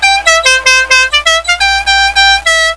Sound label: horn; mtm2demo